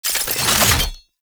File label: Incarnon Wrist Gun Transform, Weapons; Warframe; Tenno